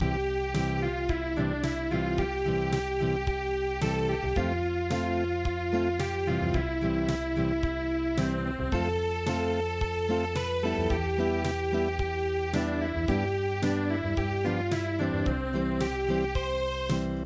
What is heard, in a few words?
Acoustic